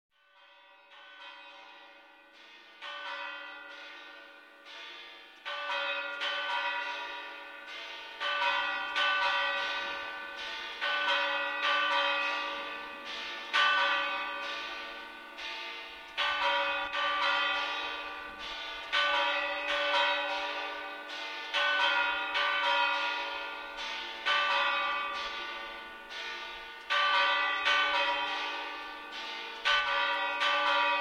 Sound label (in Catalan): campana